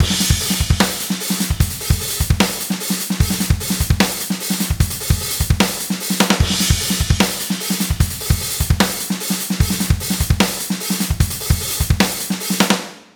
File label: 150 Groove